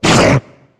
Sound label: charger pummel; player; voice; attack; source engine; left 4 dead 2